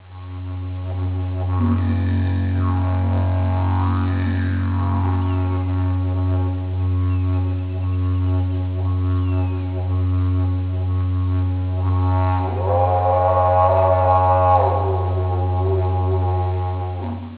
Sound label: didg